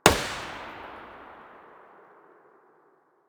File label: p90 muzzle mono far, weapons